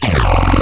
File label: gurp; quake2; fuel